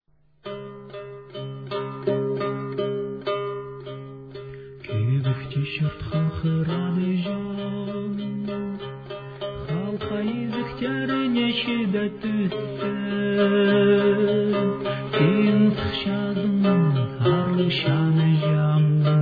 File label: chanychaam, throat singing